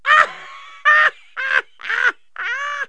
male hard, laughs; KABOOM! - Wild and Wacky Sound Software; human

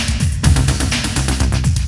drum loop

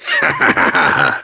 haha; SWARRIOR

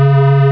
Drone; CyberNation 1.1.1

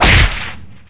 whip crack

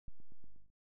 silence; falcon

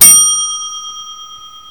Q bell

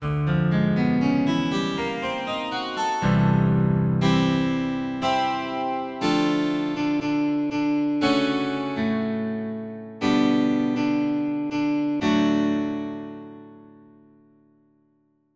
12 String Slurrr

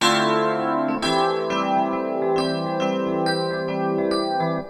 Synt; SMOOTH 03 C

